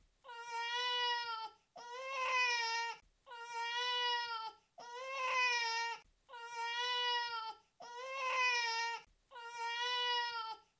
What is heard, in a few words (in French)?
pleur incomfort bain; le bain, est relativement harmonieux et mélodique. Les cordes vocales vibrent de manière régulière et stable.